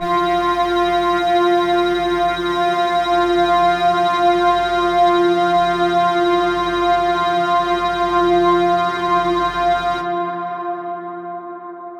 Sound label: drone skinny F